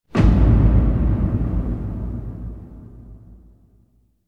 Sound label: Drum Sound; Millennium